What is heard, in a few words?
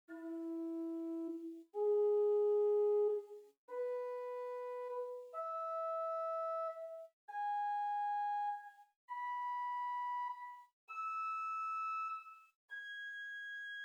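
Swell Tierce 1-3.5 arpeggio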